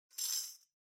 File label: Tambourine Headless Noise